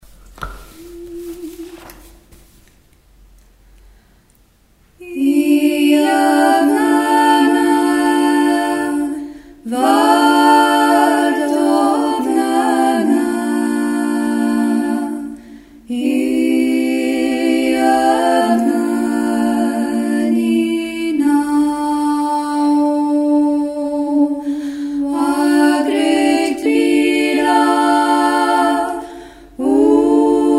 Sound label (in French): Iavnana trois voix ensemble; stage chants du monde